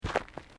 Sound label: Footsteps Boots Gravel Fine Step; Footstep Foley; Footsteps (Sound Effects:Human)